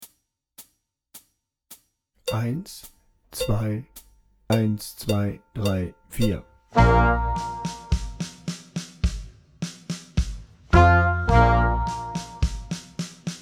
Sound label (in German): langsamer